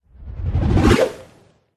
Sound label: cat attack voice bottom